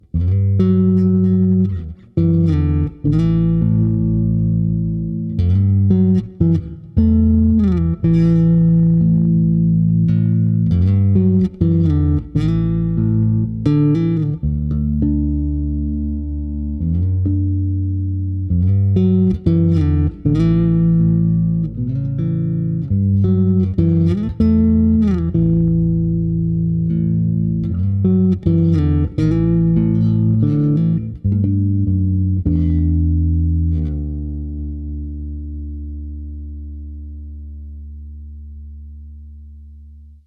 solo chords+harmonics, basso elettrico artigianale